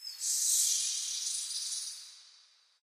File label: amb african birds; source engine; portal2